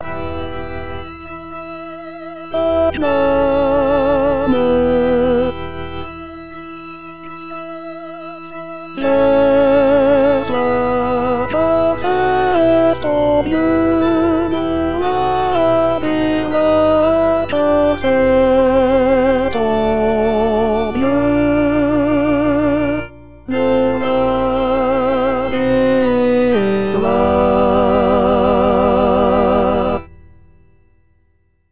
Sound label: triomphe tenor, vox; 2016 vox maria